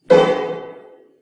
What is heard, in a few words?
piano